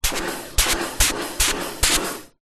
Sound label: industrial pressure washer k2 5x quick spray bursts nozzle end; kartcher; zapsplat